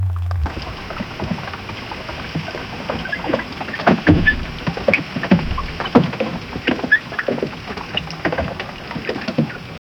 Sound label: a wooden boat with eight, oars